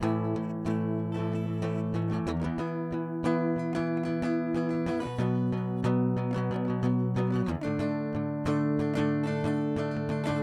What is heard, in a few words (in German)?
Donner Extrem Drive Dry; Gitarre